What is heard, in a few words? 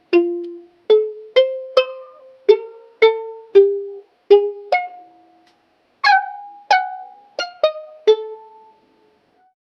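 upbeat jungle music bouncy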